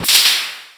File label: Magic